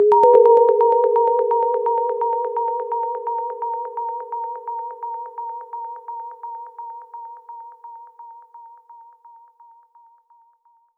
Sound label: Special Sounds Root D# 128 BPM; Vengeance Samples; VEH3 Special Sounds